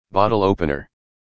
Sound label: Bottle Opener